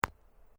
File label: Sport Ball Rubber Bounce Hand Grab, Rubber ball; Bounces; Objects